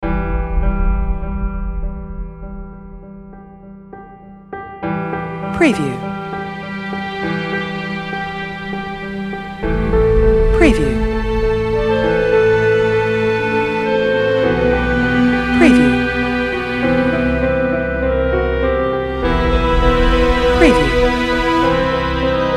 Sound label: Emotional Dark Nostalgic Piano Music Sad Background